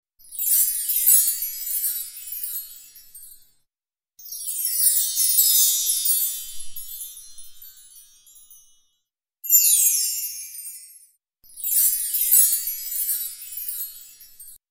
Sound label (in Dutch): Het geluid van de windstaafjes; Muziekreis